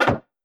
player left; Multiplayer; osu

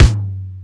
drum hit finish; Legacy; osu

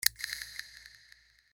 check off; Checkbox; osu

